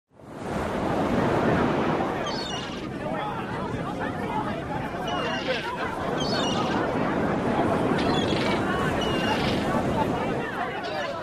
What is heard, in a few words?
Beach Ambience Crowd